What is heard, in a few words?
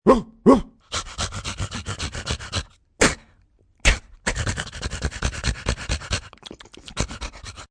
Dog Panting, big dog, pant, sneeze, happy (human voice); Dog barking, Animal; Dogs